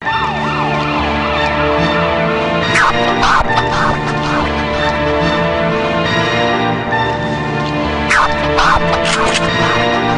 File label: black beat, hip hop